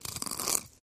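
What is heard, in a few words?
Grinding Mortar Pestle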